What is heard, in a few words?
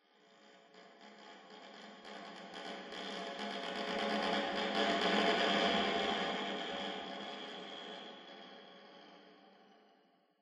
Combat Crack; The Sinking City